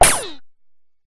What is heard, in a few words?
Laser Like Synth Dirty Distorted Laser Oneshot; sound spark